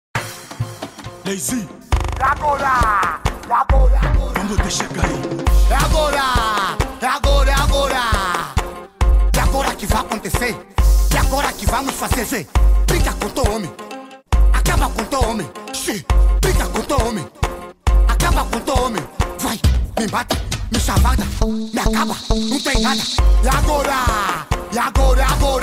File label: Afro House